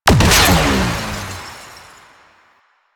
Antimatter Drop Explosion; Warframe; Warframes

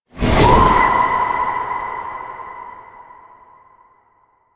Corrupted Void Ghost Drain Aura Start; Warframe; Zariman

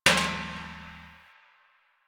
Metal Trash Can Impact; Warframe; Ambience; Nineteen Ninety Nine

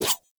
Dagger Whoosh Heavy; Weapons; Enemy; Daggers; Sinecure Dagger

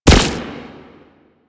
Archgun Projectile Explode; Warframe; Archwing

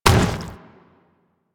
Zombie Step Metal, Footsteps, Walk; Warframe; Infested

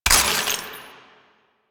Ammo Pickup Radiation; DE; Warframe; Warframes; Frumentarius; AmmoAbility

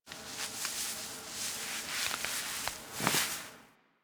Foley Idle Sleep End; Enemies; Kubrow; Warframe

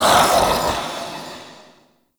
Mockery Male Vocal Attack; Characters; Enemy; Vocals; Mockeries

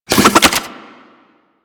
Fist Spin; DE; Warframe; Weapons; Archwing; Melee; Grn Arch Regor Fists

